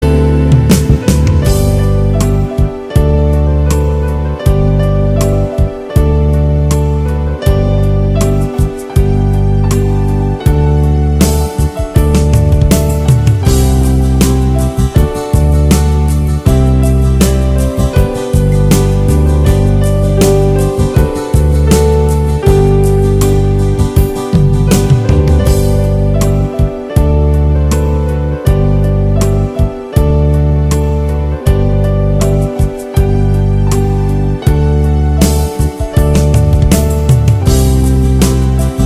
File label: Hum hongey kamyaab Instrumental cover